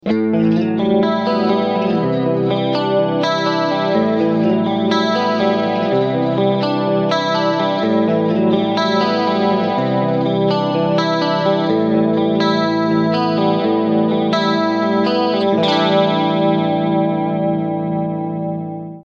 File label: JC120 Clean